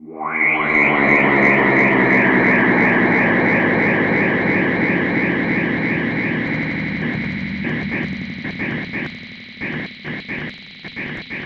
Rhodes FX; Drum&Bass; fx & sweeps; EZRollers

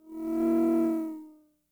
FX, Drum Machines; Fricke Schlagzwerg

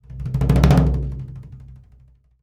AMB TOMB, AMB PERCS; Univers Sons; Basicussions

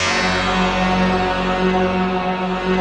rough stack

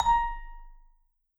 V SHRT MF A#4, Marimba & Vibes; Sampleheads; Dave Samuels

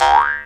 D JAW, Primitive Instruments; Big Fish Audio - Didgeridoo and Other Primitive Instruments; JAW SIMPLE

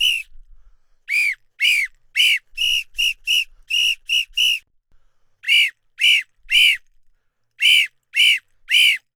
Pito Murga 110 bpm; Best Service; Festa Latina VOL-1

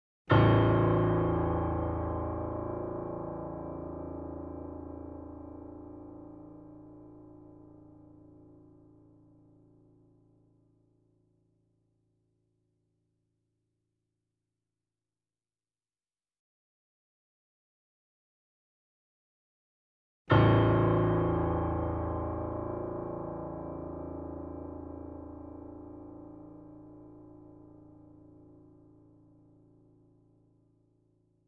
A0 Grand Piano & Effects; Sound Sampling Collection VOL-3; Grand Piano 88

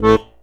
HOHNER, AKKORDEON

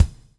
BUBBLE; Spectrasonics - Backbeat

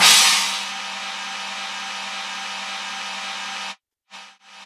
Yamaha CS6, Drums

